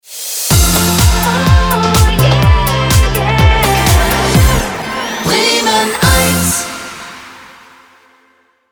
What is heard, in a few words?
Jingle Hot; Bremen Eins